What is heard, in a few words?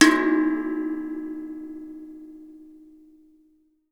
waterphone